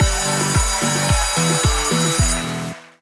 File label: freespin init; Amatic; All Ways Win